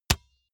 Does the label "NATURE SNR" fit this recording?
No